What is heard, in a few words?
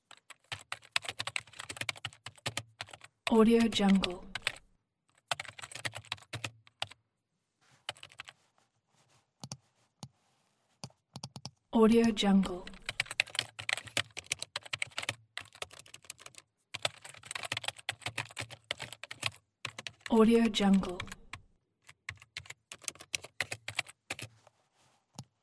typing and using mouse on computer